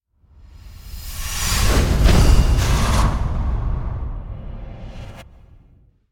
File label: Door Slam Impact - Heavy Slow Motion Abstract; Hollywood Edge; sound designer's toolkit v2